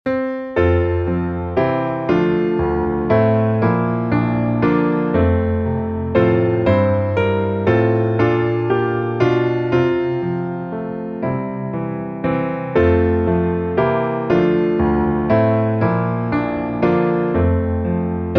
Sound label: piano